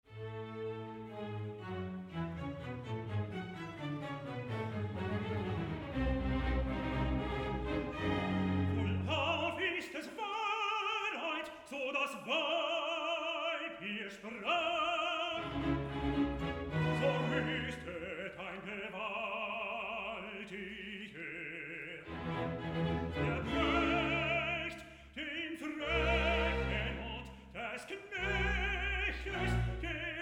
Recitativ und Arie; Salomo

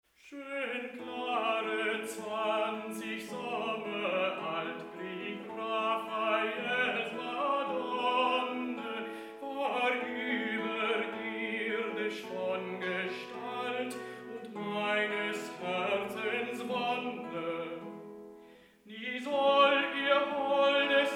Lied

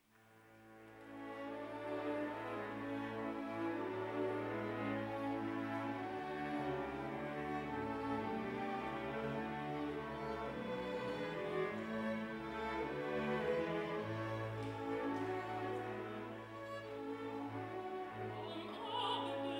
Rezitativ und Chor Erzaehlerin; II Auf dem Wasser; Jesus Simon Juenger

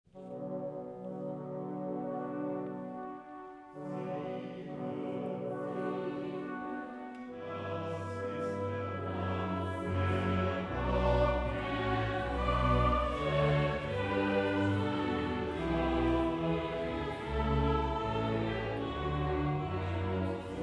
Chor, Intermezzo

